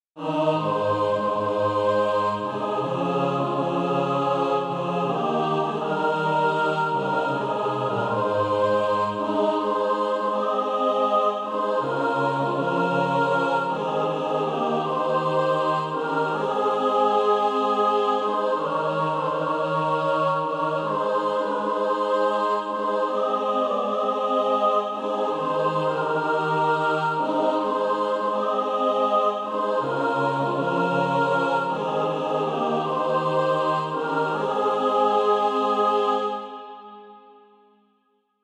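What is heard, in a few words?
mixed, Practise Music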